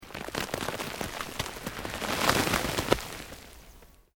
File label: EARTH LOOSE MOVE LONG, Debris